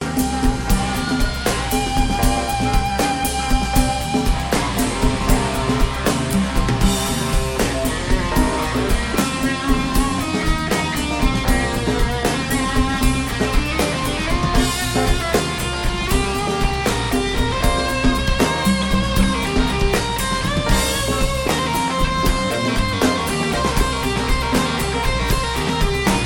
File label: War Drums